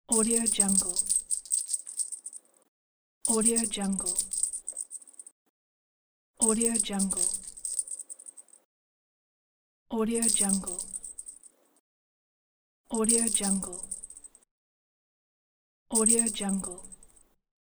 pet collar bell jingle

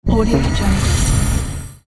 reveal

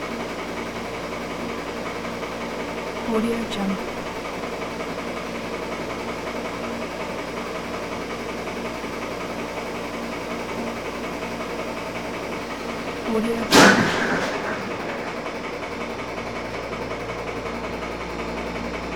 old ice machine

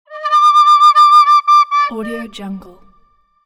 flute

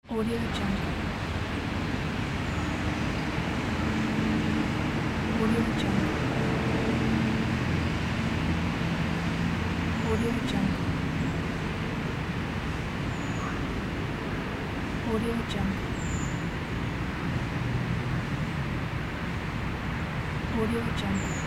city noise ambience

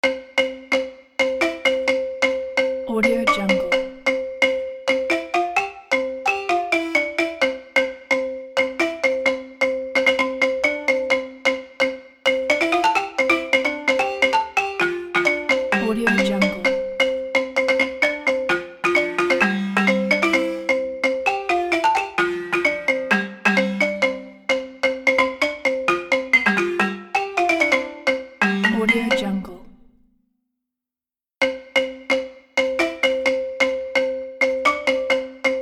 savanna

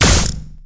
future weapons electro gun minigun shot single; future weapons 2; soundmorph

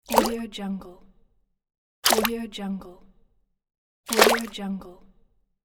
small splashes

